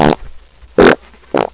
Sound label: fart